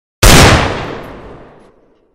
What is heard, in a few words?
desert eagle shoot sound effect; csgo; Deadgle